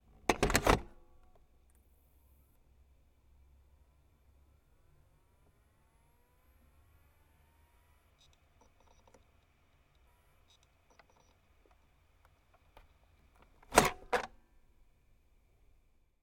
Hard Drive Dock, Drive, Insert Drive, Spin Up, Eject; Office